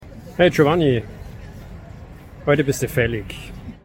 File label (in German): Tennis; Tennis Terminator